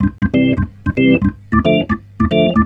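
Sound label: reggae organ C; Best Service Advanced Media Trax Modern Composer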